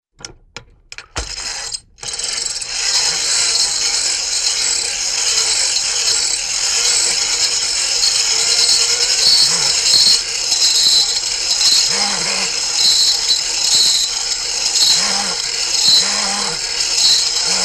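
Rapid Winding with sharp High-pitched Metallic Scrapes, cartoon; Hollywood Edge; Cartoon Trax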